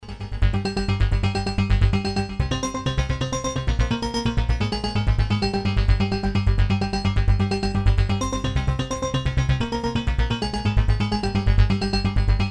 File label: arp korg z1